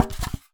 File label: Polystyrene Rattle